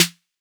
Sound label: 808 S d, Roland MV-8008; Roland MV8800